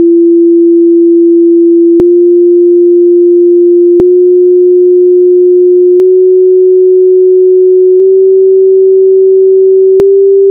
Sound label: sound semisemitones